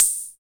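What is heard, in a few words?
HH CR-78, hi-hat; Sound & Vision - Gigapack I; ANALOG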